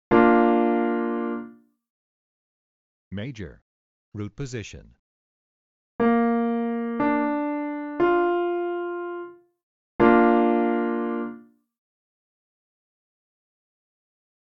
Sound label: Major triad inversions root and second inversion; Chord Recognition: Triad Inversions; Training Exercise